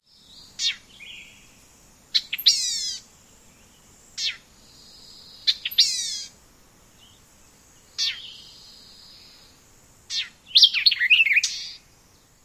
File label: calls song fragments